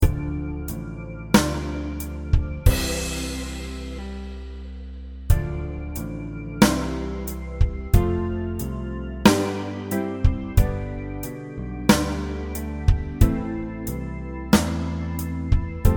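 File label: karaoke, Recorder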